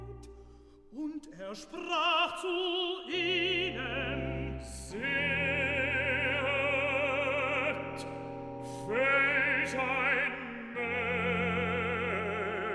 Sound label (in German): BASS, Stimmen mit Klavier; Johannes Passion